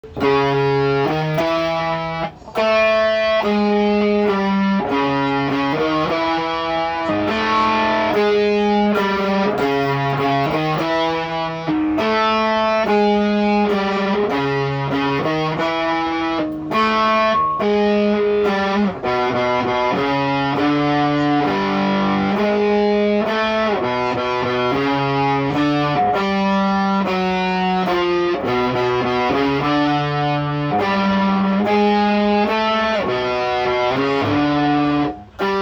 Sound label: single note riff